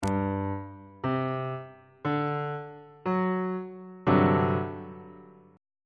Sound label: f double sharp major 7th suspended 4th chord on bass clef